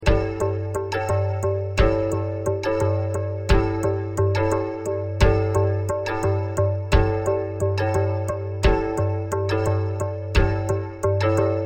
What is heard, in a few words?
2 5 polyrhythm